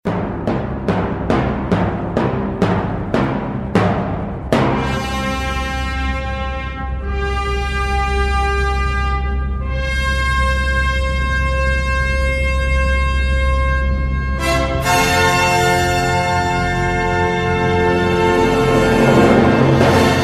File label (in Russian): fanfary; chto gde kogda